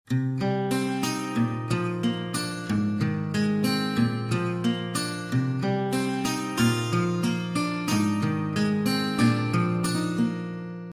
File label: Romantic